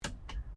CAR HOLDEN FJ INDICATOR ON, Classic Australian cars